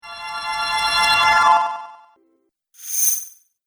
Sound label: Magic Spells; Magic Sound Effects